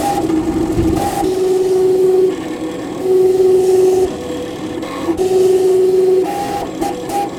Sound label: PRINTER; 1000fx